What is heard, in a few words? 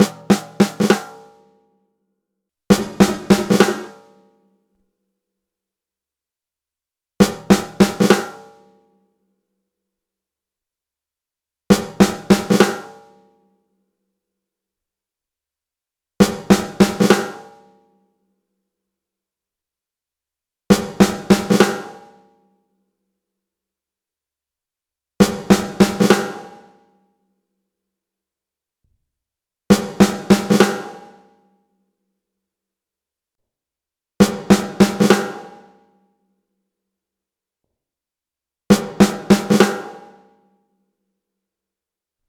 Sony DRE 2000 Real Snare Sequence